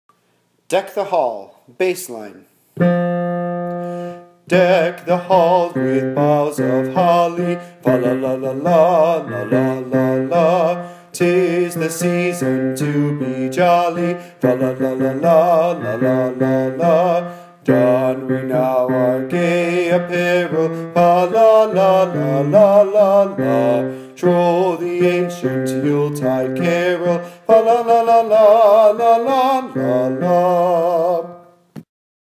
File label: bass